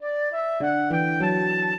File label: minuet, flute harp